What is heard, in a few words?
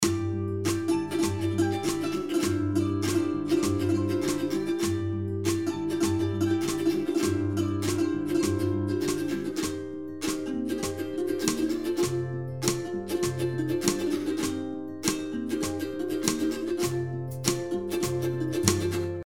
uku start click; ur824